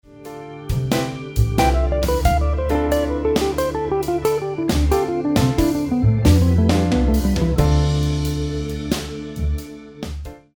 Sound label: dorian lick